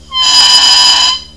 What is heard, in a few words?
crow